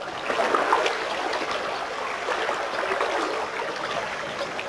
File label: shore; DAoC